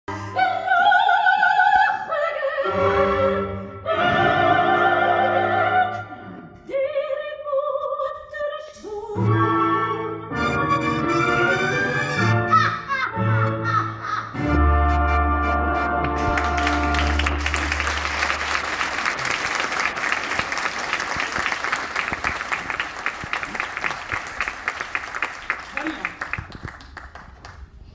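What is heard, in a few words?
theatre music applause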